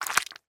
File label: squish; easter